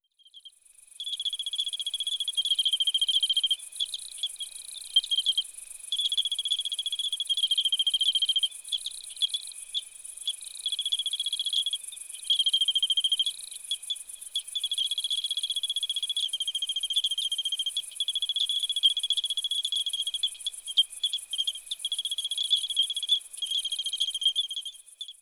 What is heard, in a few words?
Eugryllodes Pipiens